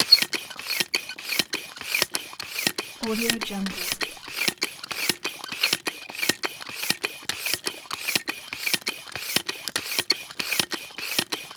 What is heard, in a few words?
air pump loops